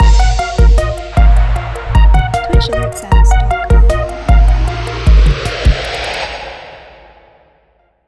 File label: Trap Follower